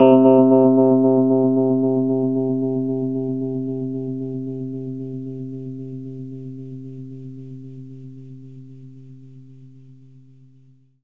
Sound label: Rhodes fast, 3D Keys; 3D Sound; 3D Keys VOL-1